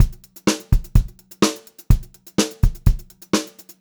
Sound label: 126 PO5, Pop-Rock Drumloops